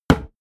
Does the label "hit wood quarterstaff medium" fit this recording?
Yes